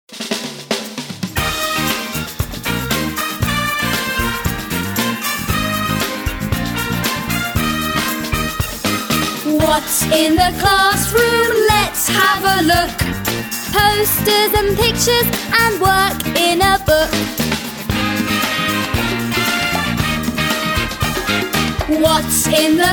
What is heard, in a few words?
karaoke